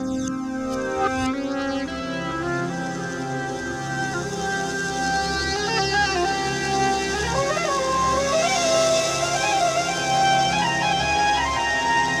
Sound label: AMB SAX CYM, AMB SOUNDS; AMG; Global Trance Mission VOL-2